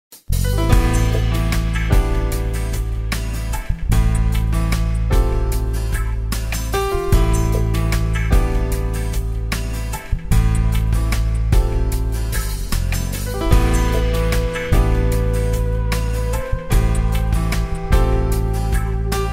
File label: Romantic